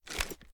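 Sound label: pistol 1911 fast reload end; gmod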